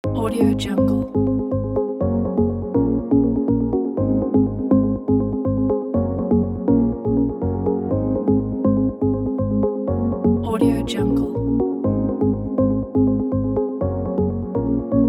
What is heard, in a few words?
upbeat minimal corporate tech